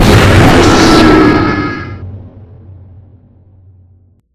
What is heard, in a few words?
Jumpscares; Five Nights at Ronald's